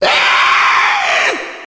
zombie infection male

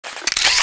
gun clip in; weapons

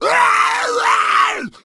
zombie infection

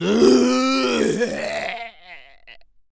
zombi death, male

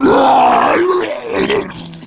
zombie infection heavy

